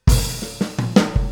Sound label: Boogaloo - Full Mix, 82 BPM; Drums; Intro; Sonic Foundry; Drums from the Big Room The Mixes